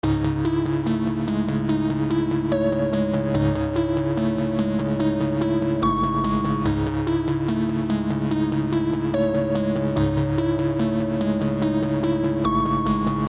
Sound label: Serum Synth